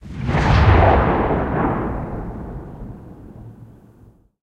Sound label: cannon 37mm kwk36 tail far